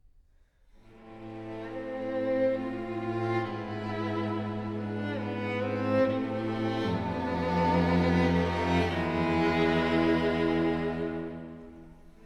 string quartet no 2 f major poco adagio

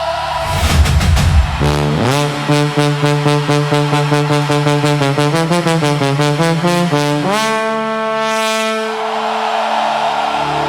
boosted bass remix version

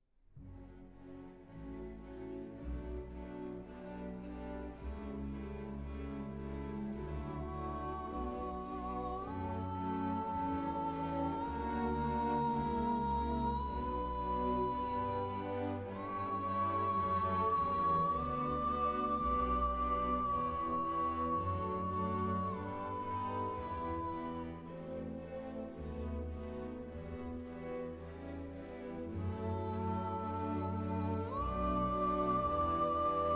arr for cello orchestra

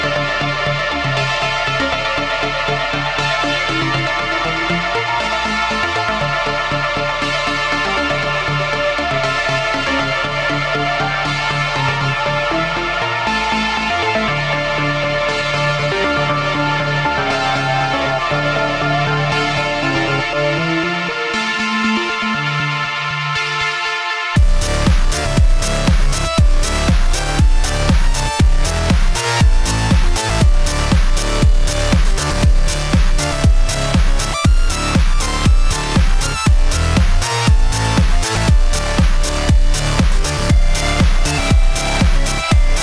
workout music gym tabata songs workout

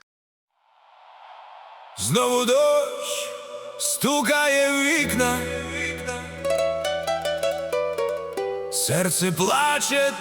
ukrainian music; ukrainska muzika v masinu

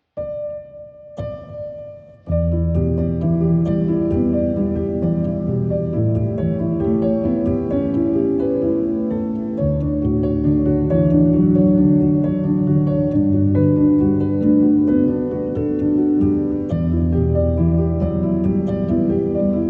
slowed reverb; pop

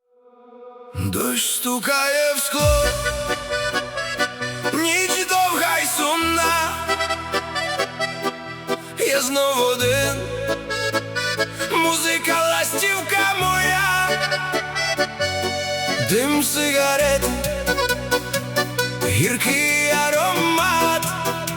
ukrainian music; ukrainska muzika v masinu

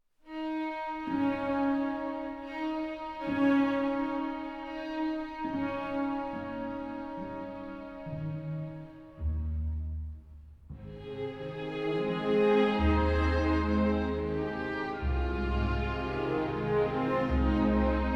string orchestra

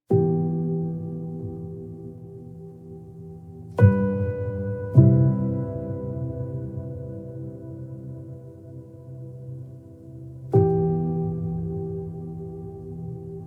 piano sonata

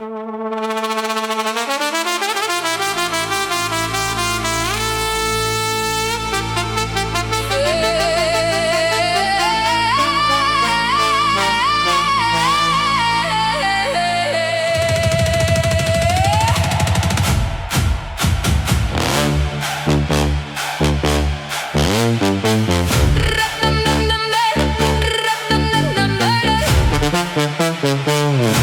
bass boosted remix

